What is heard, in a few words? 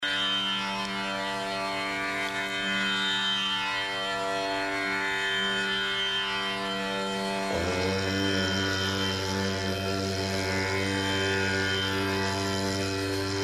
om aim sarasvataye namaha om, mantry; av yoga